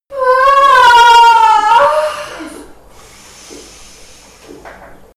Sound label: birth mother moaning